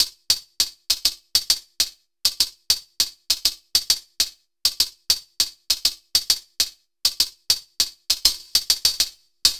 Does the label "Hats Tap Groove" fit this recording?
Yes